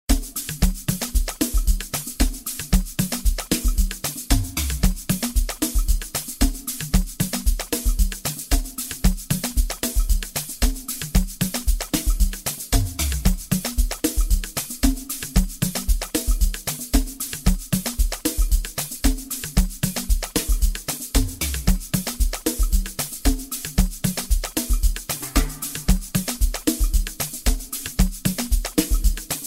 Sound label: Forest Groove